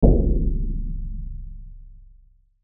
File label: Hit Drum Low; Hit Drum Low Filtered; Musical; Blastwave